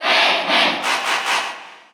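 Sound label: Cheer Russian, crowd; Pac-Man; SSBU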